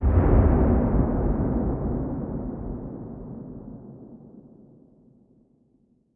impacts; World of Tanks

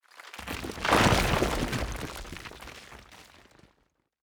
quarry dump rocks; Rust

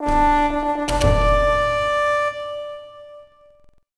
Victory Middle; Civ4 warlords

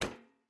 footstep barefoot metal run; Rust

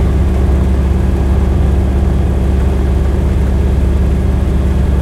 yak cockpit, engines; War Thunder

